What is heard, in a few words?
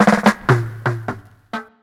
percussion reggae roll